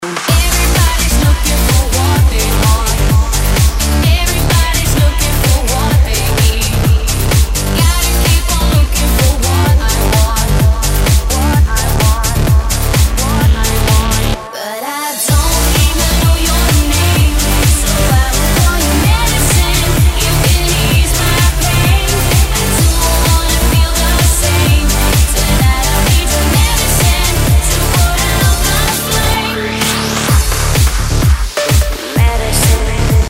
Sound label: Melody, RingTune